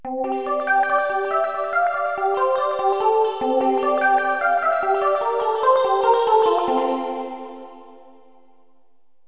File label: echos; Roland; An Invitation To The Roland World Of Music